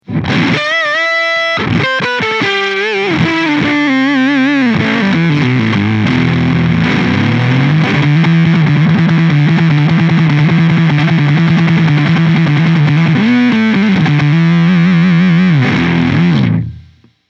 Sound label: FUZZ